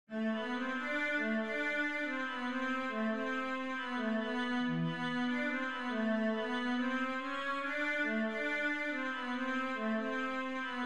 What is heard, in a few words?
basso cello